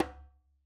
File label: djembe Single Hit Left Hand Slap; Raw Perc One Shots; Slate; SD SEISMIC Drum Sample Pack